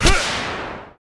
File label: Archer attack